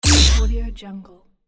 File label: modern interface increase